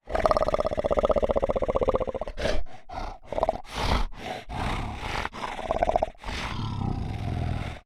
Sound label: snarl